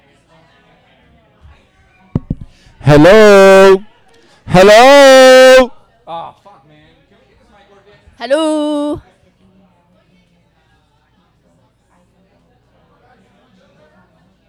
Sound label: Audience Recording; a-meal-premiere